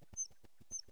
pratti, bats; China bats

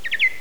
soprano social, bats; britishbats

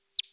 natterers, bats echo; britishbats

